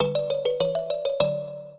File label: minuet, mbira; Mozart